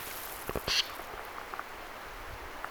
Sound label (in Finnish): vahan erilainen kurpan lentoonlahtoaani; Taustalla pulmussirrinuori, pajusirkku.